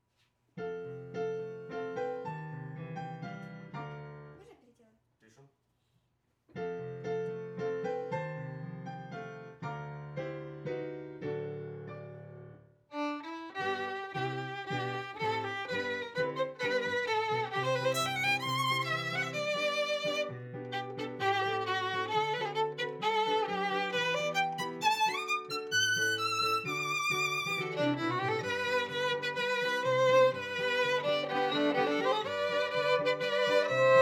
Viola C; The way of the cross

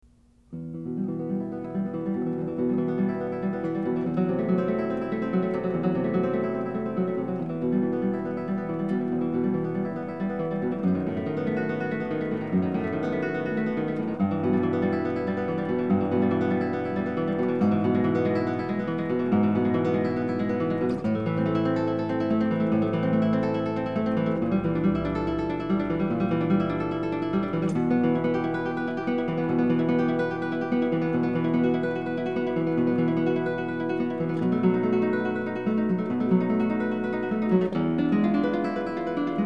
Etude in E Minor, Guitar; Villa-Lobos; 300 Years of Guitar Masterpieces

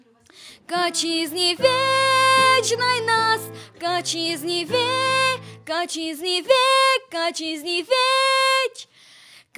vocal

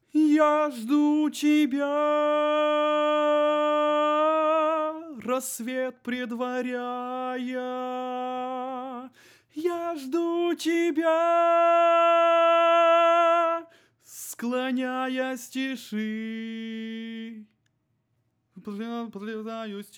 tenor

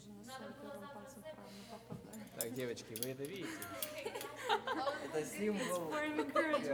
Overhead

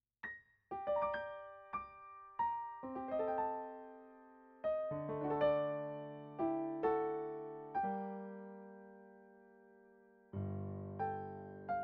Piano

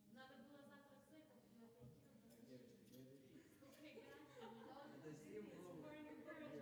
Piano

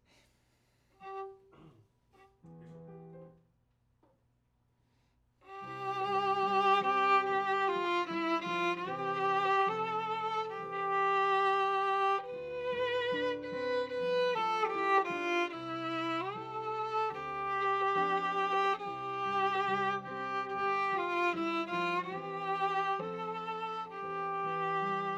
Viola C

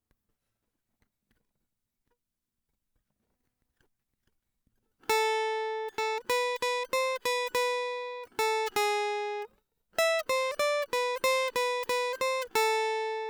guitar nice

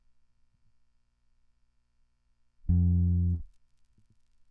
bass

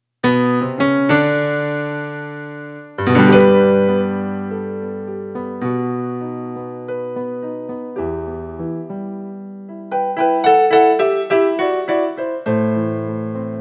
piano

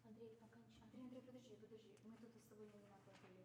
piano high

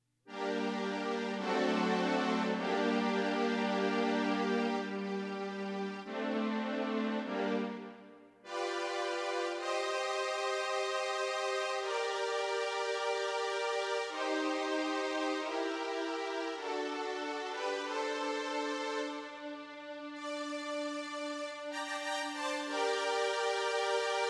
synth

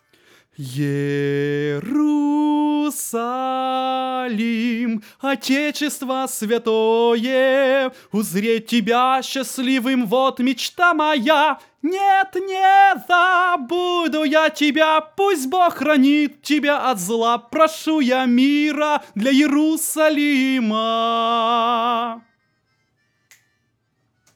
alto

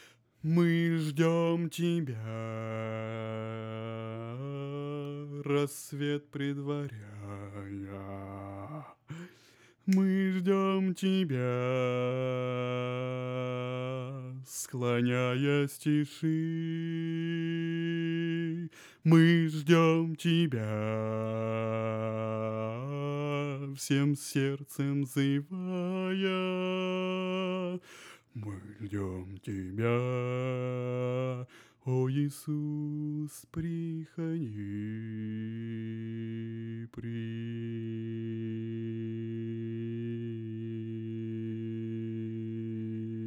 bass

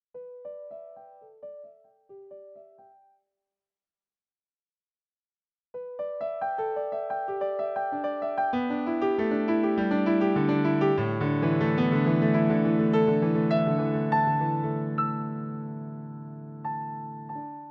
Piano

